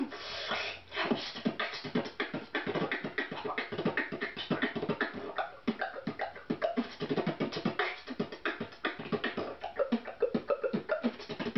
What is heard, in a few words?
beatbox, ringtones